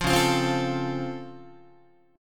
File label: C sharp Augmented Major 7th C sharp down Guitar, chord; strummed; JGuitar